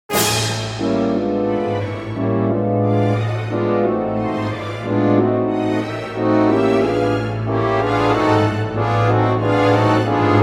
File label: wo piano